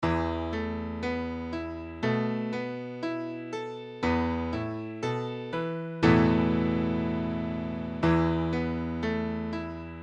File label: Esus4, piano